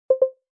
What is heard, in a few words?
failure, phone tones; LG GD570 dLite